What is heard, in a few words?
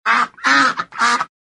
duck honk